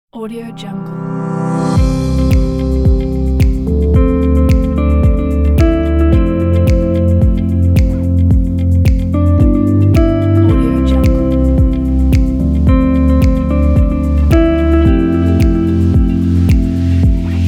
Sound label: positive ambient background